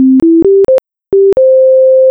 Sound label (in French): succes long; Gravity